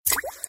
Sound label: shortest sms tone; ringtones